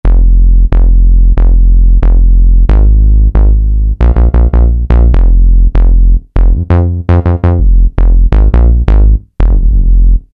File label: 15inch Bass; Roland SRX-05 Supreme Dance